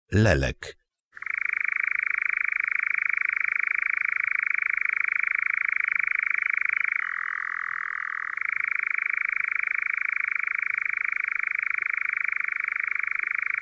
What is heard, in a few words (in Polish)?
LELEK KOZODOJ